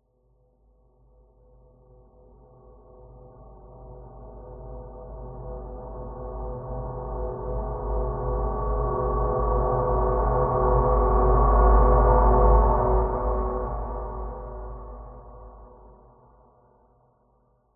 horror tension building metallic mid low freq swell